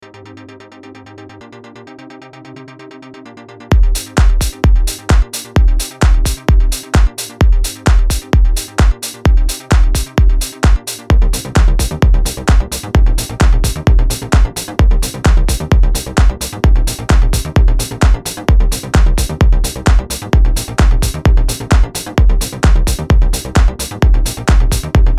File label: 90s Progressive